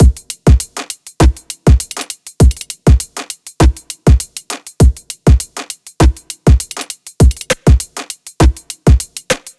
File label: EDM Drum Loop 100 BPM; 99Sounds x Monosounds; Dance